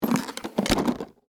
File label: Case Set Down Small, Foley, Case, Set, Down, Small; Objects